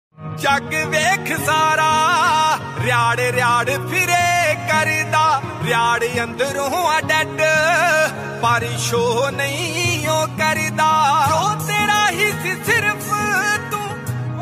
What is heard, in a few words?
Punjabi Tune Ringtone